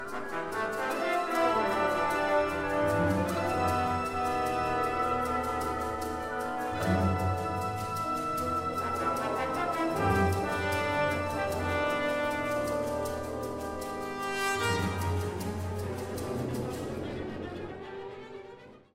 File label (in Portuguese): caraxa